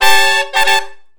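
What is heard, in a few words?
R&B Riff (A) harmony, Horncraft; Crimson Blue and Fabulous; Sony Creative Software; Horncraft 4 RnB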